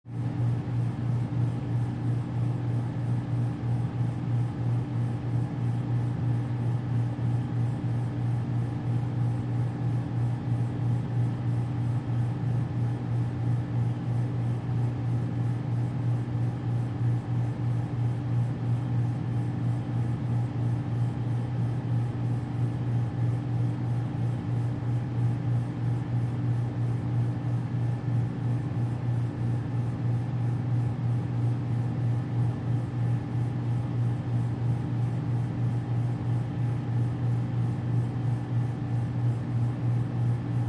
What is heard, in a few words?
Heavy Ac Hum Buzz